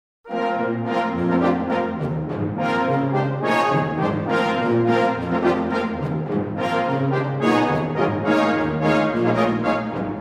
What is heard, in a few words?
Intrada